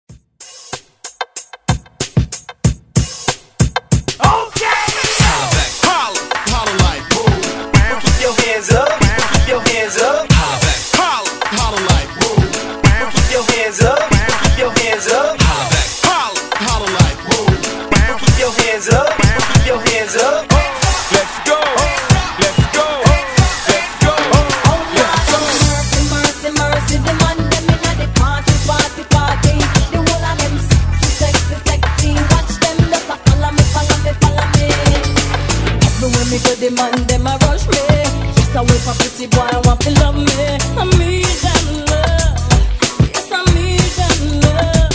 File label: Hype Redrum Old Skool 94 Bpm